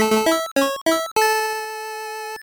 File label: Success; California Games; Master System